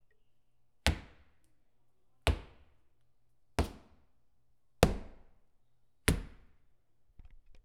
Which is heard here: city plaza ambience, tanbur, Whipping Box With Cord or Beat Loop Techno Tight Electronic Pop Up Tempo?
Whipping Box With Cord